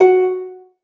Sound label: lyre